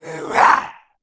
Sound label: attack, ice snow man; monster